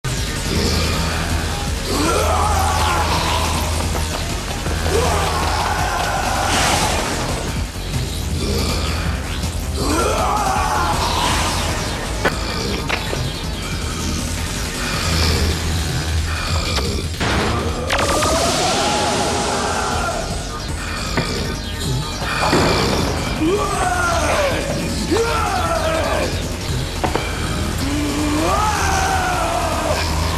energy creature; Frank Welker

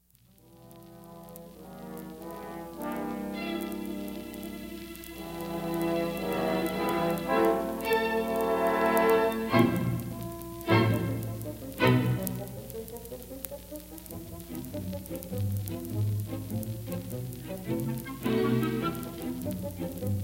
comical mood

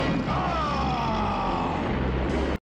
roar; Hulk

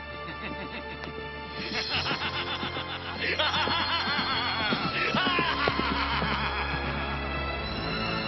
laugh; Mandarin

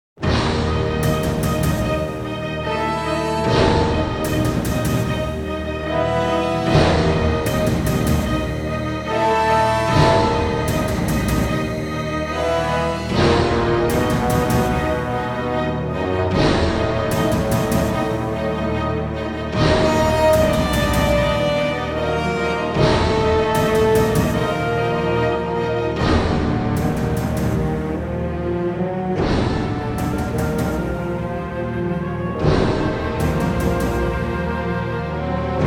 game over; Graeme Revell

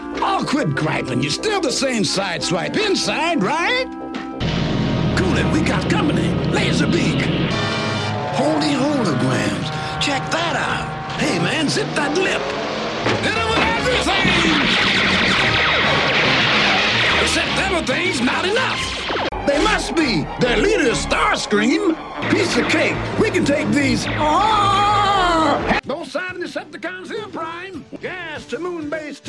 jazz

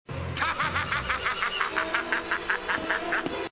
laugh; Red Skull

